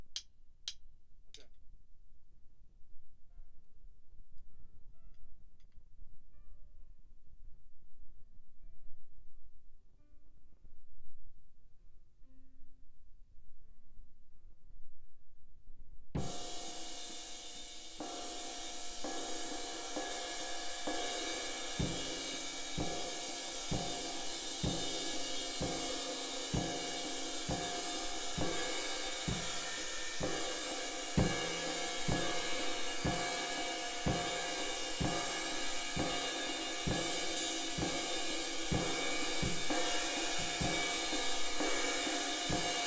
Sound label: Kit